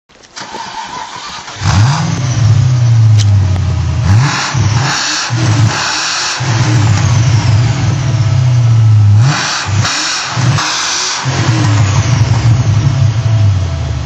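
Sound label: mg rv8 throttle, engine sound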